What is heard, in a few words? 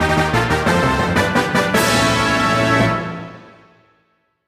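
fanfare bonus perfect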